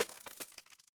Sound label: impact crash